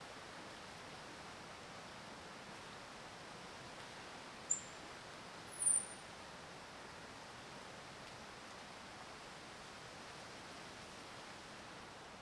Passaro cantando na beira do rio; aves

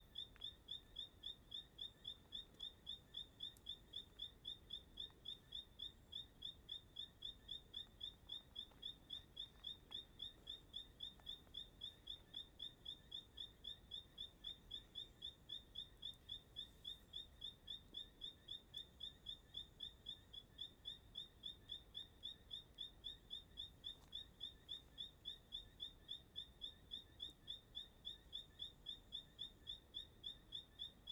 Entardecer no Parque da Chapada dos Veadeiros com passaro cantando como um; grilo; aves; Coleção Sonora do Cerrado

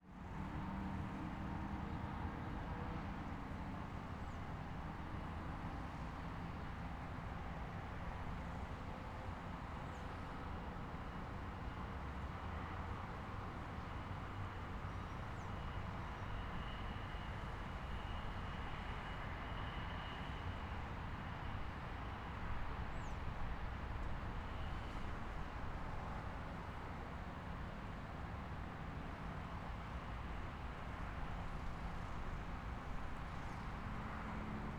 Ambiente cidade tranquila rumble passaros; ambiencia urbana

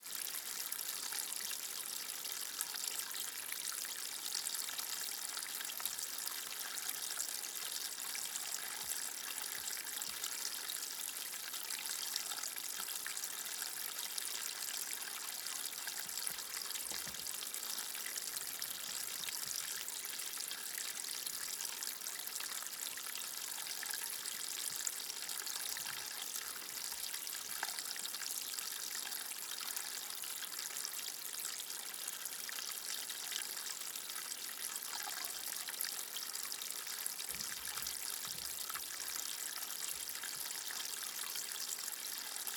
Nascente de agua com fluxo grande na Chapada dos Veadeiros

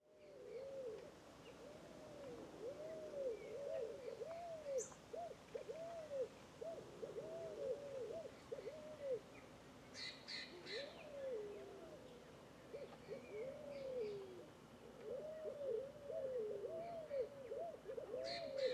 Varios Passaros Fogo Apagou Juntos em Bambuzau; aves

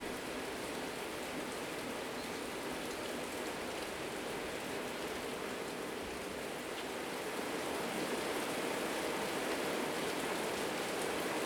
Chuva aumenta e diminui em chacara a tarde

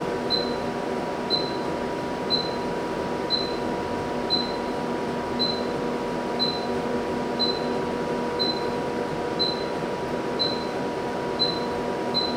Equipamento de depilacao a laser; Cerrado; equipamentos

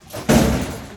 Porta metalica grande de comercio batendo forte; portas

